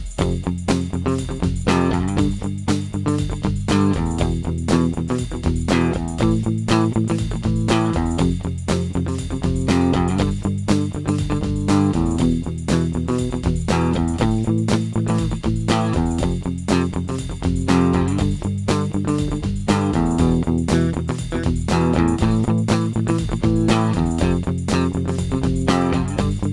power chord

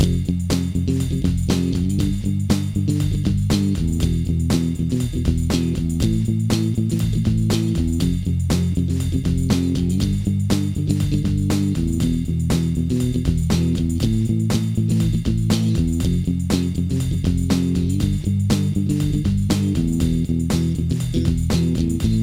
powerchord

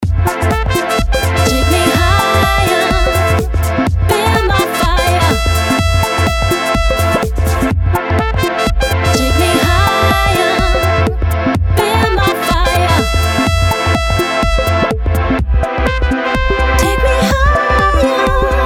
Disco House

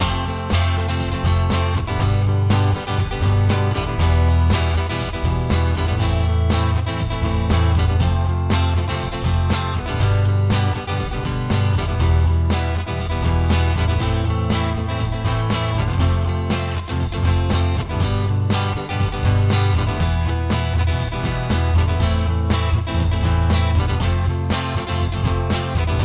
front E acoustic

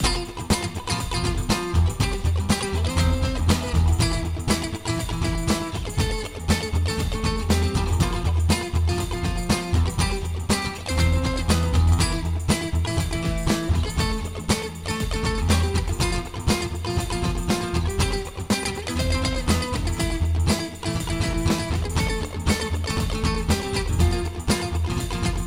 octave E acoustic

